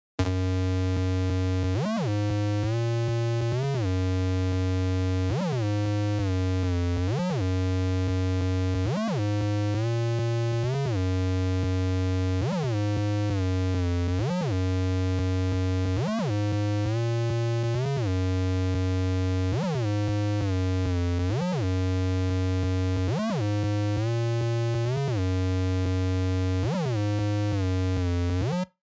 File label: bass